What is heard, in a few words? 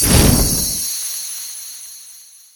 flame